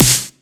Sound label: dry acoustic snare b key